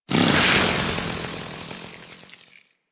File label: Kusarigama Ignite; Grn Kusarigama